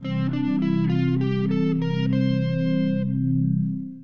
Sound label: C Myxolydian